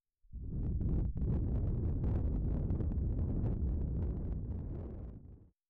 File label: Ear-licking (Left and Right) ASMR; マンション管理人～旦那が単身赴任で欲求不満な人妻～